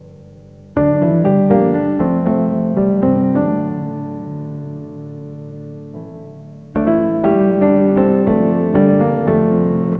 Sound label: piano